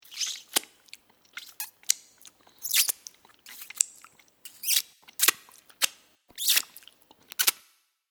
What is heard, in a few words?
Sucking the ends of fingers clean; Finger Licking; Mouth